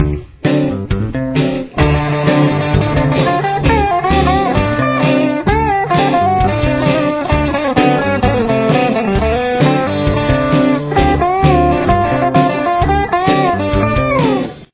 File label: solo